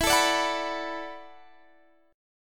E 7th Suspended 2nd Suspended 4th, down, Guitar, Standard; E7sus2sus4 strummed; capo=3